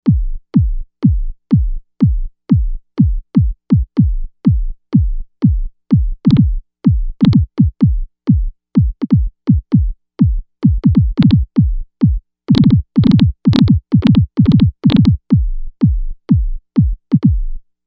Solid Kick, Novation UltraNova; Factory Patches

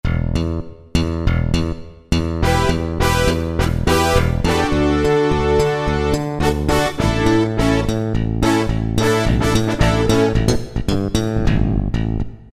Slap Bass - Syn Brass, Roland PN-D50-02

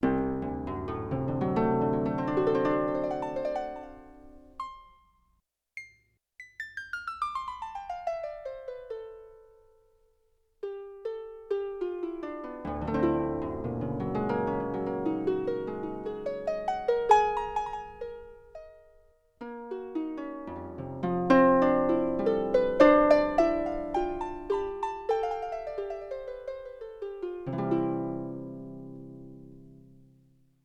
Harp

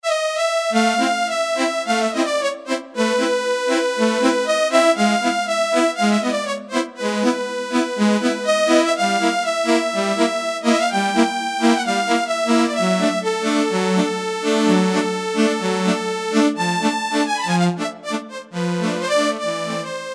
Key, Alesis Micron; Factory Programs; StreetsOfParis

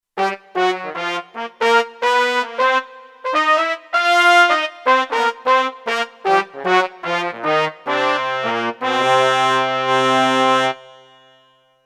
Trumpet Section, Kurzweil K2500X; Factory Programs

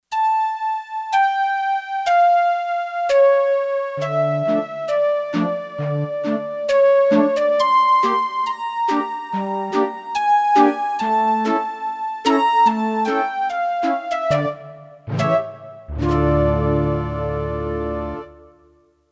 Synth Caliope, Kurzweil K2500X; Factory Programs

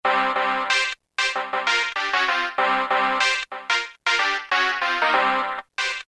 Galaxy Hit; Roland M-DC1

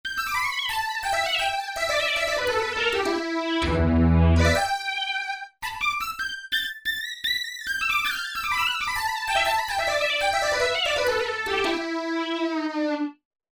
Lead Synth, Korg MSC-1S; Korg MSC-1S Variety

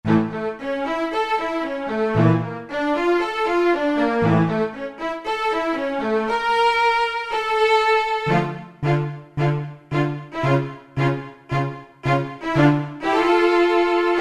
Quartet, Roland SR-JV80-16 Orchestral II

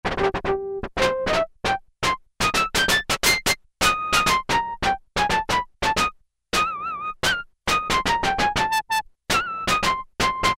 Low Flute, Korg Poly-800

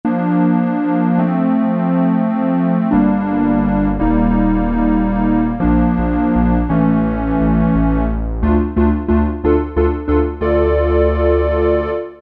SQUARES, Kawai SX-240

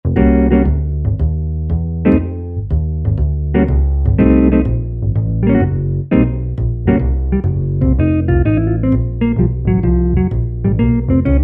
Standup & Polytone, Korg TR-Rack

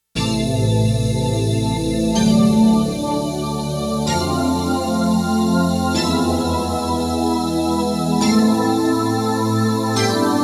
SYN STACK, Roland JX-1; Factory presets

